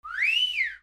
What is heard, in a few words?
WHISTLES